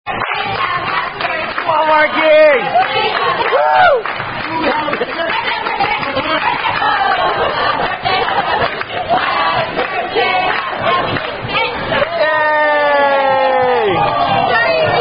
Birthday Song; Red Robin Happy Birthday (Tune Alouette); fieldwork

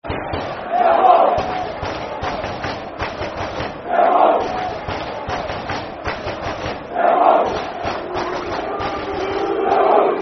Clap Football Chant (Soccer Song); Millwall Clap; Millwall Mfc

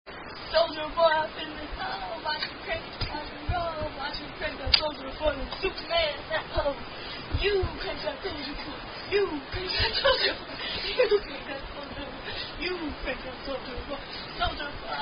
Unknown Chant

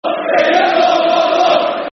Football Chant; Tranmere Rovers Trfc

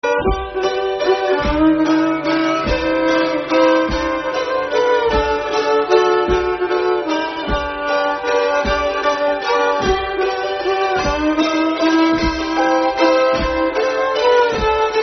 Waltz G

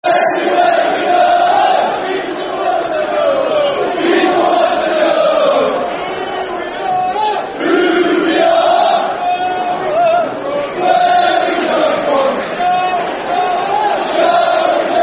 Barmy Army, Football Chant; Yeovil Town Ytfc; fanchants

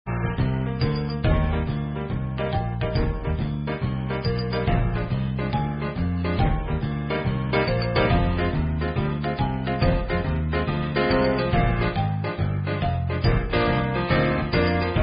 Swinging Along [Instrumental]; Girl Scouts

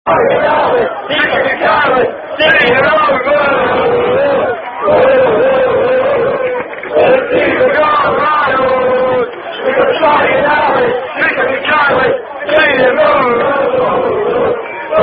Unknown Rugby Fan Song; Where The Team That They Call The Rhinos!

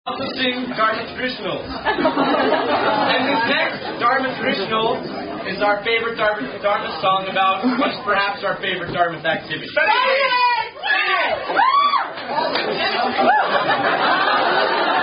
A Cappella; Son Of A Gun; Aka I Wish I Had A Barrel Of Run

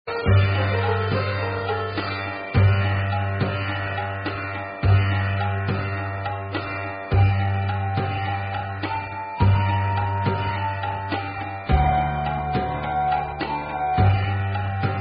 Kyrie, Surinam, Instrumental; Girl Scouts

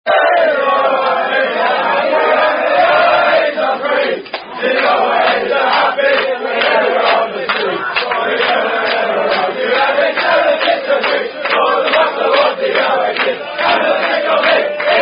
Rugby Song; Oas Are Happy; Unknown Rugby Song

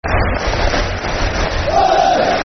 Hudders Clap, Football Chant; Soccer Songs; fanchants; Huddersfield Town Htfc

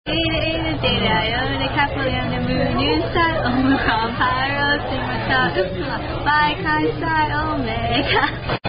Greek Alphabet Song; Tune Oomy Goomy

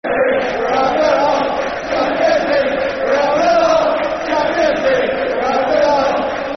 Football Chants (Soccer Song); Chelsea; fanchants-com

